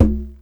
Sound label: Single Hit, Conga; percussion; musicradar